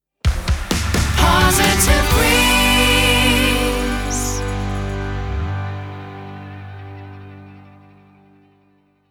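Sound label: Sung Jingle, 129bpm G major, Sting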